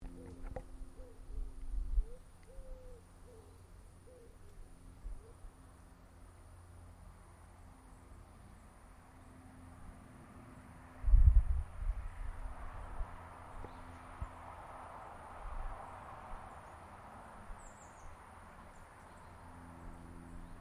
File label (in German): Wald Landstrasse 60 Meter Entfernung; Zoom H4essential